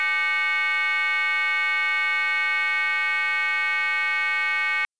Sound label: tone 100 signal